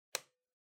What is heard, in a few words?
Calculator Switch